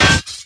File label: metaltomb